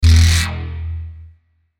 Digital Buzz Swell Filter, Digital Distortion High Pitch; glitches; Blastwave